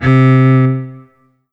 Yamaha TG500 ST Cello C3

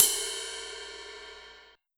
Yamaha RM50 Ride Cymbal

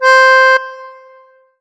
Roland SC 88 Tango Accordion C5

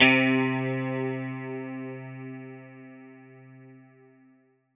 Yamaha MU90R Chorus Guitar C3

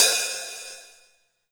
Open Hi Hat

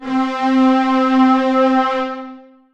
Yamaha TG-77 Strings C5

